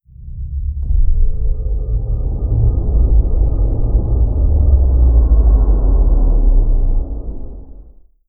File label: fallen empire colossus death; apocalypse